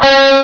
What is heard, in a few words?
Elect. Guitar; If Monks had Macs; MacCube Volume 5: Home Applications